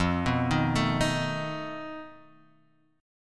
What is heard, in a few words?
F 7th Flat 5th F; x,x,3,4,3,4,5,x